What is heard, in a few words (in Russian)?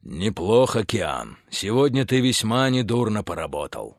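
garmon, accordion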